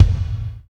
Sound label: CYBER KIT, DRUMS